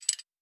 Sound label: Metallic Gear